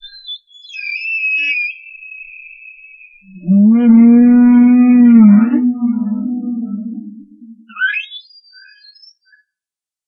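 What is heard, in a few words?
humpback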